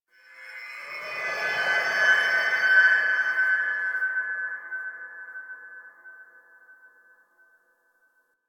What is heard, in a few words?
Unlock Oddity Message; Oro Kitty; Warframe; Collectibles